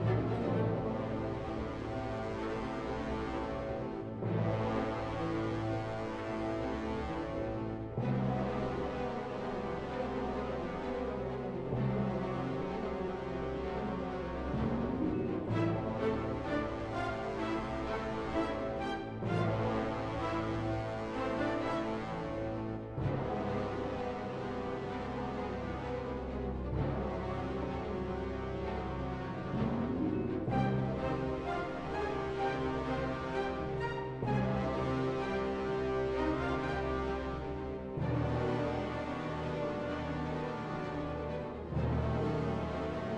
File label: Music Short Punctuations High Tension 128bpm Loop; asterix obelix slap them all 2